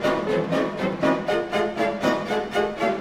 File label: Orchestral Loops; 128 VERTIGO